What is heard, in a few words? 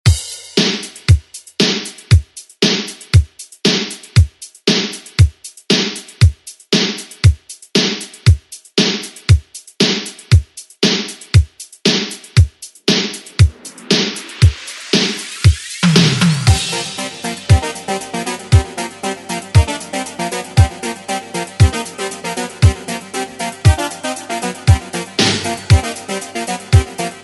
80s ReDrum; DJ Mhark